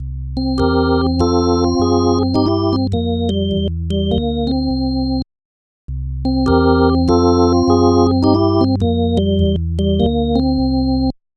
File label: B4 Phrase